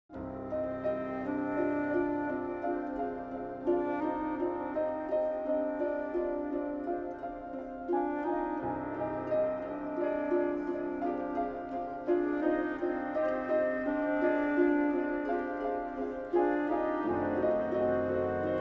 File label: MUSIC harp; Myst III Exile